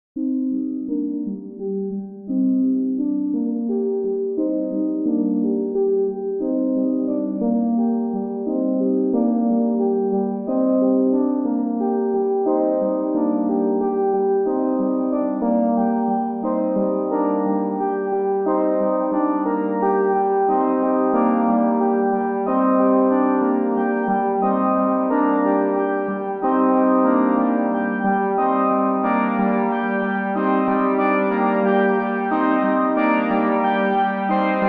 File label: morphing piano